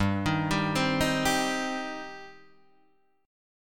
G Suspended 4th Sharp 5th G, down, Guitar, Standard; Gsus4#5 arpeggiated; JGuitar